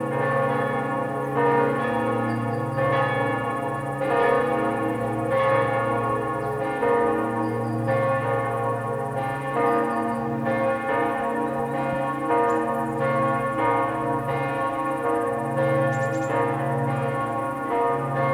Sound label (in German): moenchsberg glocken; spuren